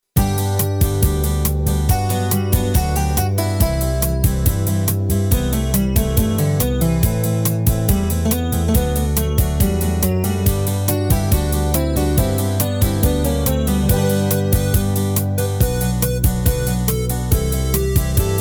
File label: Country, MIDI soubory